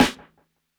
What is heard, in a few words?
SNARE, DRUM N BASS:JUNGLE BEATS